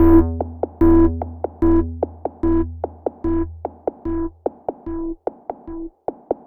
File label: Ambient Rhythm Break; Cinematic Funk Urban Tone Poems; Sonic Foundry (Sony Creative Software)